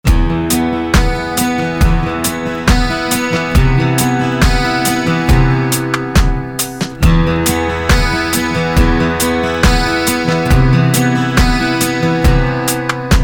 YAMAHA FG TA full mix

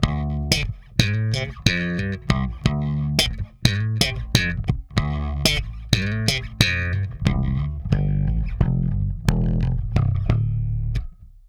bb735a active mid scoop

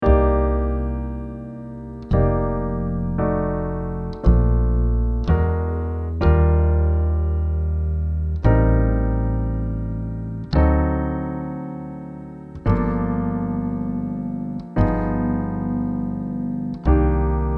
2 hands plus bass roots

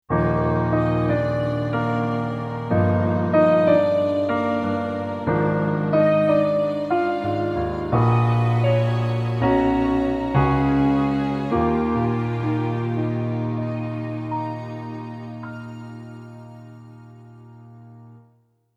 Piano n Strings

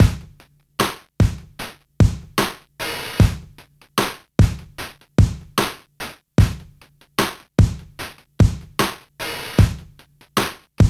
Drums Lo Fi

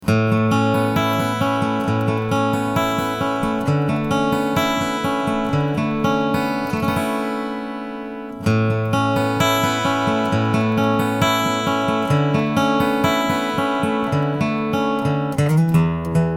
ACOUSTIC AUDIO CLIP FG TA

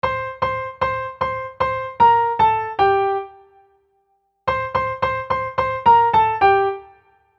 2 speeds

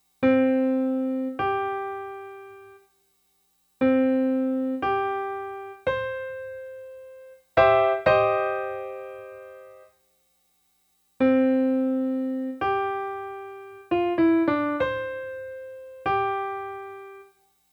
perfect 5th P515

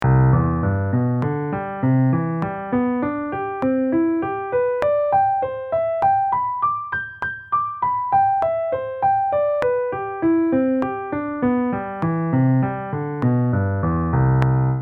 Slow n Fast

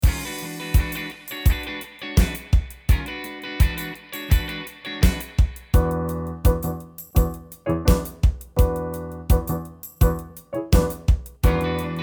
Guitar keys rhythm

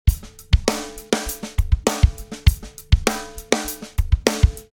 Compressed drums

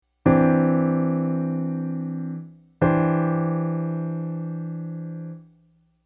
Sus2 over 3rd chord

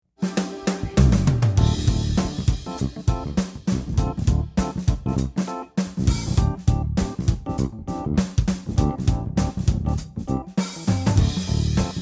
BUSY Dynamics

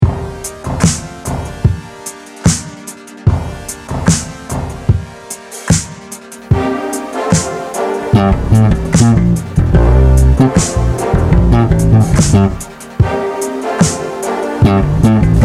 First Take bass up; groove

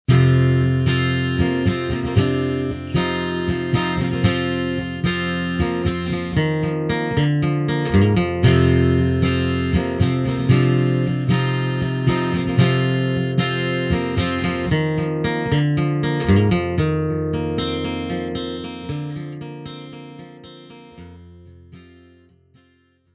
ribbon mic

